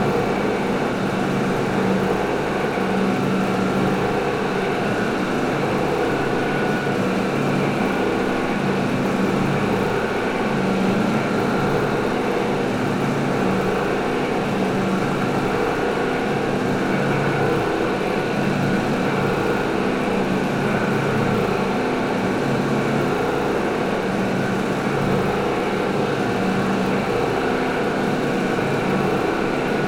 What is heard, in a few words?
MOTOR Electric Lighthouse, 4th Floor, Inside Lightroom, CU, Lantern, Low Rumble; West Point, PEI, Ca; Articulated Sounds; Lighthouse Electrical Motor Tone